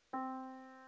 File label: yamaha Disk